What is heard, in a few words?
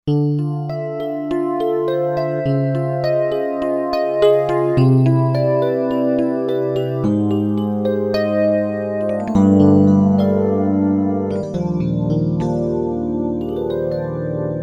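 Piano Chorus Bell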